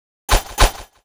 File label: Snow Man Attack; xmas